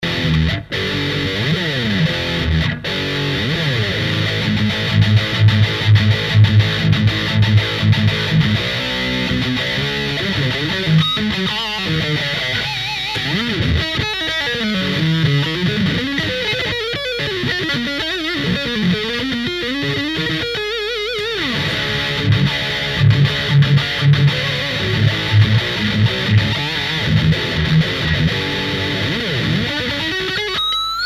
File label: Ubermetal insane eq sg vox ac30; line6 tonecore